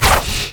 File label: decoy swipe; LoL; monkeyking